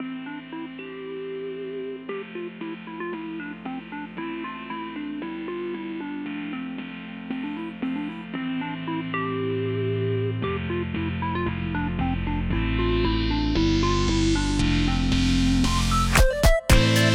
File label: jolly bubblegum